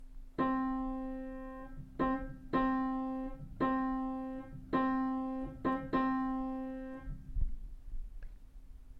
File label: cymbals; cymbals crash bang